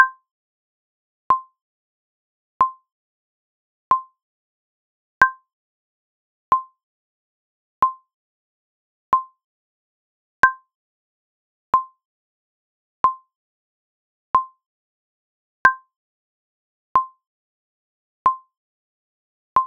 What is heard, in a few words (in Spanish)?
bolero 46 metronome